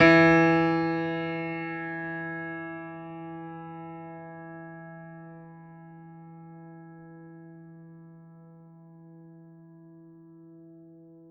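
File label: Classical Piano E3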